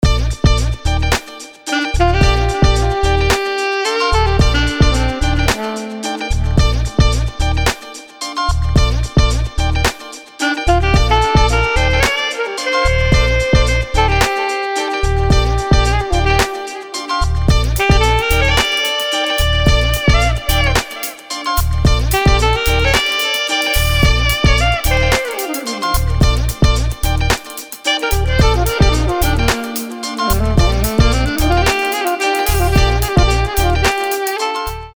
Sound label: Smooth Sax Riffs